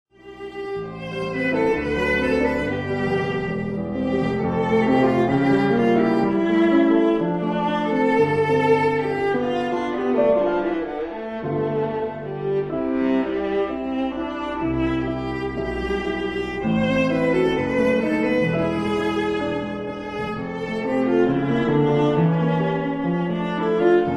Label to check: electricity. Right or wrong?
Wrong